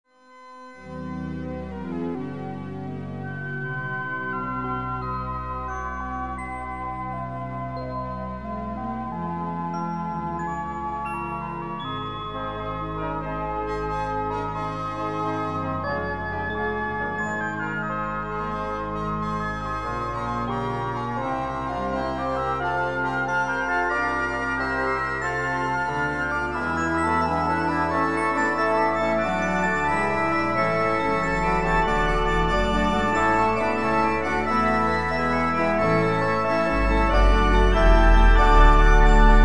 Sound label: Fanfare